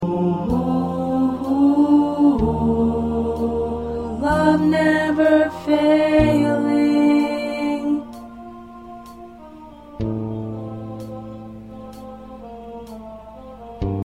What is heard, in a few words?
Alto, choir parts